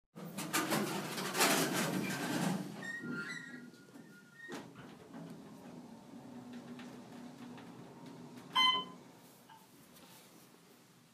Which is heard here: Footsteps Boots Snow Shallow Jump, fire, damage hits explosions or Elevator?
Elevator